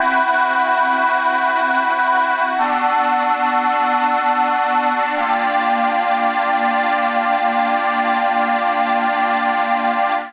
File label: Creamy Voices Chords D minor 93 bpm; MIDI Clips; Music; Accompaniment; Ableton; Chop and Swing